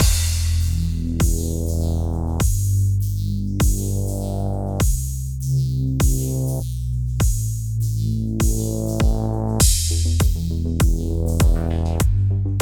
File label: Mix Stem Layer; quantum recharged gamerip 2023